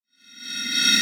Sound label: Hissing Approach